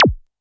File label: korg trident